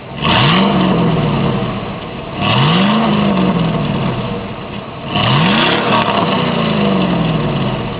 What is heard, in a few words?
revs; Corsa